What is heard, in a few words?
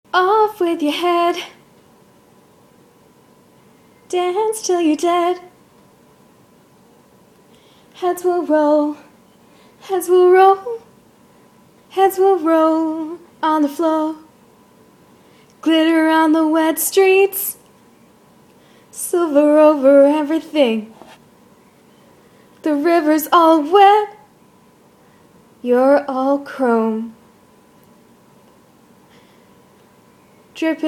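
more singing, Female; [more intense]; [request]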